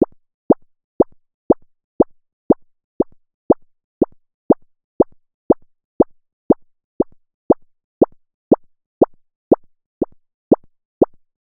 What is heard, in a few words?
Footsteps Comical Walking